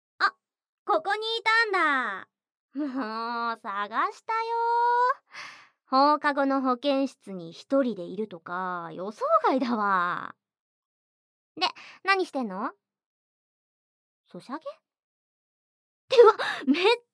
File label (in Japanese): 囁き耳舐め手コキ; 冒頭; ギャルJKと援交えっち！?